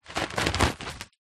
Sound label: leisure kite nylon movement